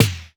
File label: Dance Club, Drum Machines, Korg 01W